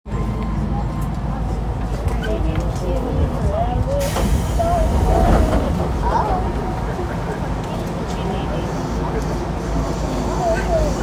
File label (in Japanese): 京急本線蒲田南, train sounds